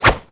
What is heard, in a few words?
ATTACK BOW H